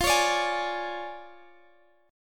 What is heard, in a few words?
D Minor Major 7th Flat 5th F, guitar